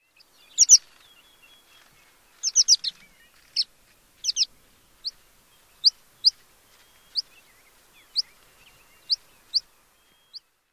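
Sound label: American Pipit calls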